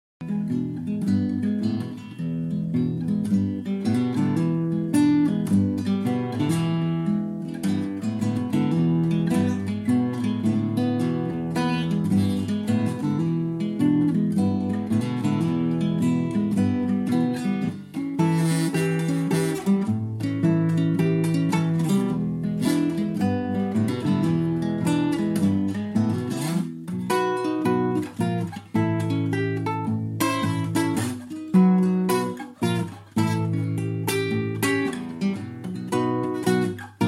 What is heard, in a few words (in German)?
Gitarre